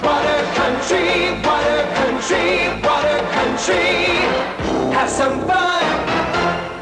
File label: jingle; water country